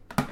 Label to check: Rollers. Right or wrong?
Wrong